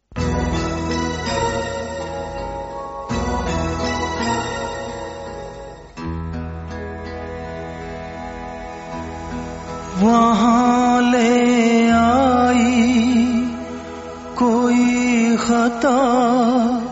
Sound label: Sad Version; jurm 1990